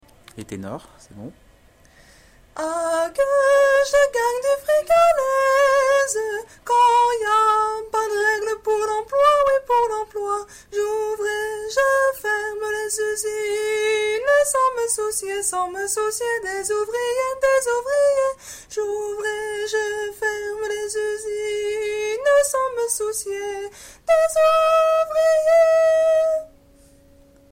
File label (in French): tenor